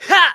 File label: kiai; MonsterHunter; Fight